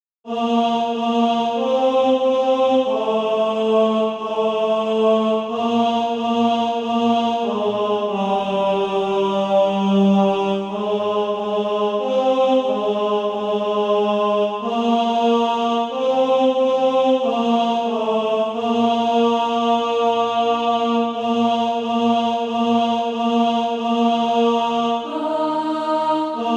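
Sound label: tenor, Practise Music; Cross of Christ Lead Onward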